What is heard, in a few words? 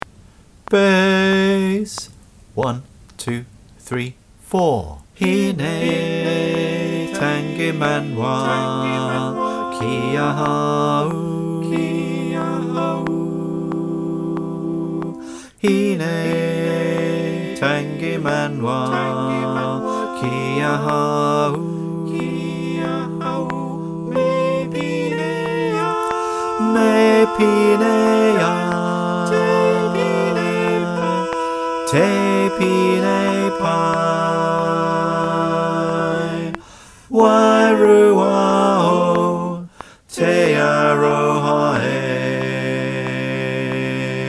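click track bass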